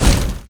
snow throw; garrysmod

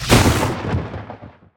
arc; garrysmod

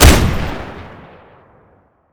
nail; garrysmod

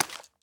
hand plop; garrysmod

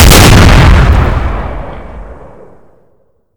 quad gun; garrysmod

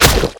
eat gib; garrysmod

